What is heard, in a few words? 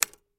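Typewriter Single Key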